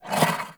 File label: Wyld Blind Shot; Powers; Fey; Saboteur